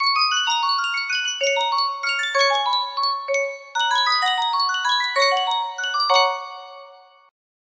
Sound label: sankyo miniature music box